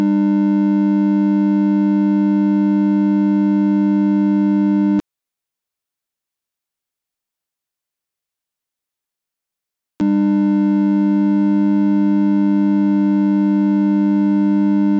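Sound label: 5ths Pythagorean equal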